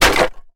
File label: Bullet Hits Wood Ratt